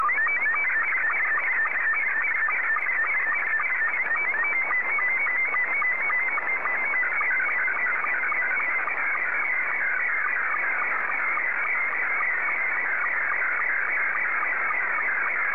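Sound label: SSTV, Scottie 2; JA3QJQ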